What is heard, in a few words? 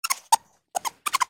Pets Skunk Beg; creatures; Lego